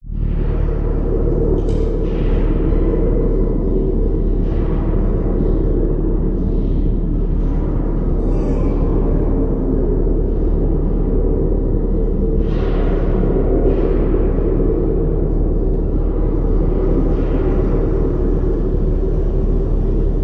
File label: Motor Torture Deep Low